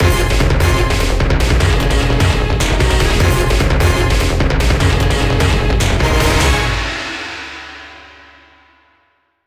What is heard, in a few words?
Jingle Huge Timeup; Tank! Tank! Tank!; Wii U